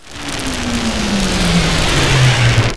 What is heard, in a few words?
meteor